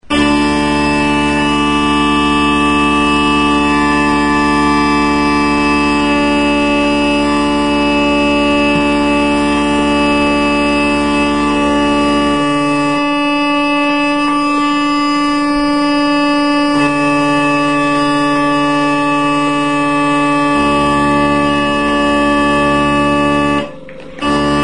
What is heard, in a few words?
drones, uilleann